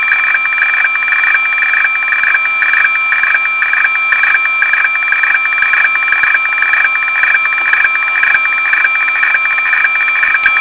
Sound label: radiofax